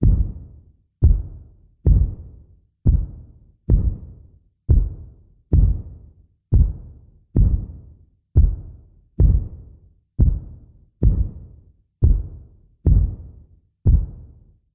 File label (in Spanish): robot caminando